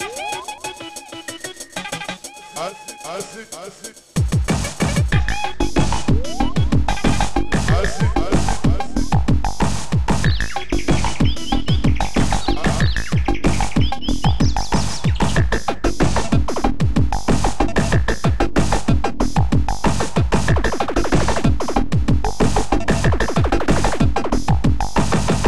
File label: acid panic, modules; CD Gold Vol 1; Amiga-CD32-CDTV